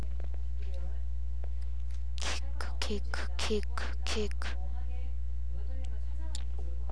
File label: kick